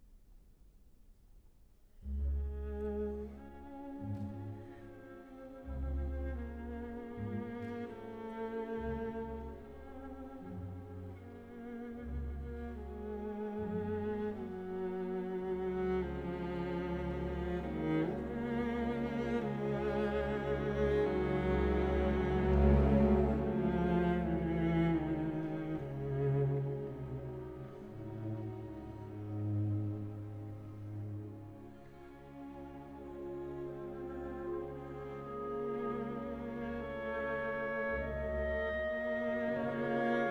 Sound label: for cello & orchestra; Adagio; Bychkov; Silent Woods (Klid); arr. from From the Bohemian Forest, B. 133